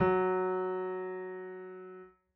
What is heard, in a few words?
piano f#; Golden Crayon Sounds